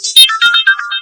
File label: Infinix Note 7 Lite Notification